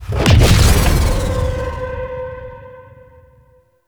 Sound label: Reinforcement Notifier; Warframe; Tau; Fallen From Heaven